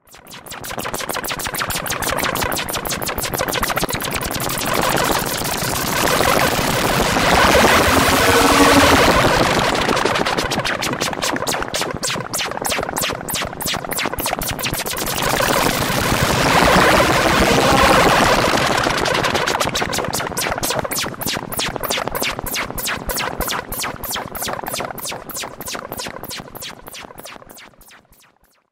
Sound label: modular